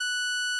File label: PeakSlope F#6